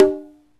CONGA SET; OMI - Universe of Sounds

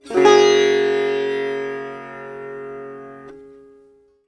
SITAR CHORD; SITAR CHORDS

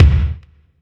Tom, Drum Hits; Zero-G Trance Inducer; 90 s Sample CDs

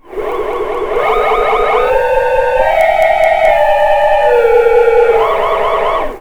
SPACE ALARM; Big Beat Samples & More; AKAI; ALARM KIT

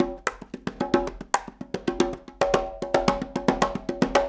Raw Funk Congas, 70s Analog Funk; Sonic Foundry (Sony Creative Software); What it Is! 70s Analog Funk

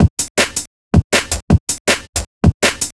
Tight Beat, Drum N Bass; Sonic Foundry (Sony Creative Software); Knutrix Drum & Bass

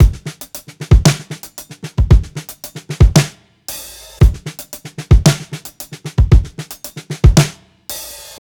114 16TH BEAT, DRUMS LOOPS; BASEMENT DRUMS VOL 2

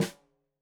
Snare Stereo, Drums; Deeply Sampled Drums; SMDrum

